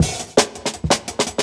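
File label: Jungle Breaks, 167 bpm; Original Jungle Breaks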